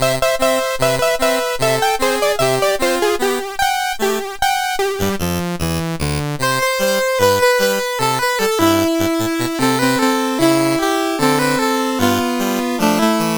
MOS6581; MOS6581R4

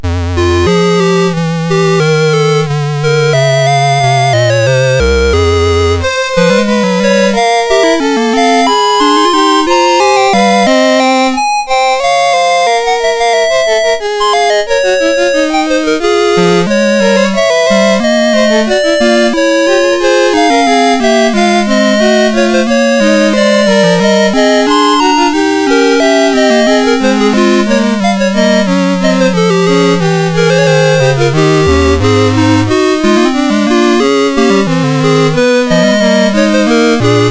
Invention in F Minor, MOS6581R2

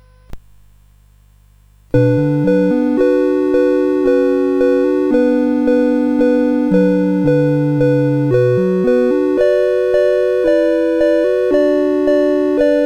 Prelude of the Bells, CSG8580R5; Veeger

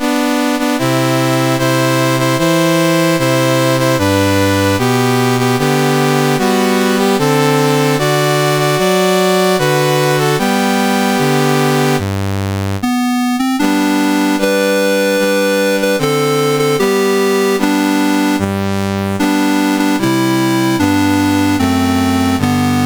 Indonesia, National Anthems, CSG 8580

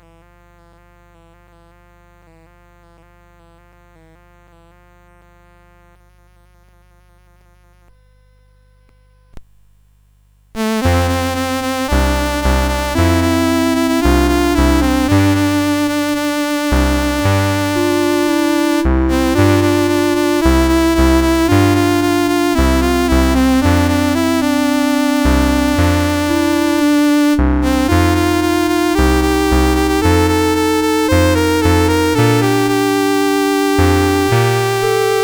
CSG8580; CSG8580R5

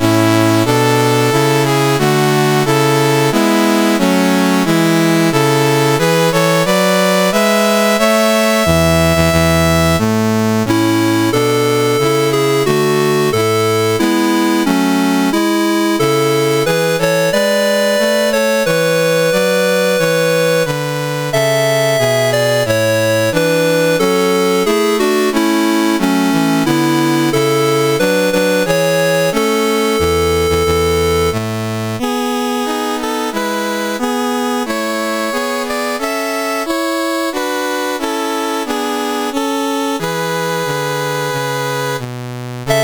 CSG8580R5, National Anthems; South Korea

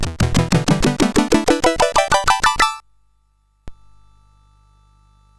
sid CSG8580R5

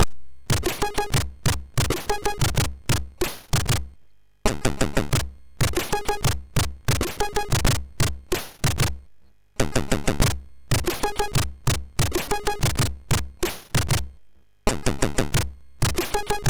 Birthday Sound, MOS6581R2

